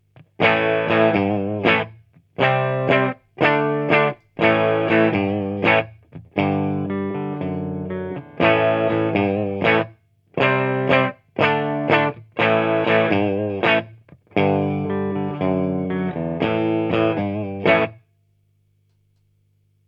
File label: 6V6; palmer drei